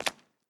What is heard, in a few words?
concrete wet footstep; adams venture origins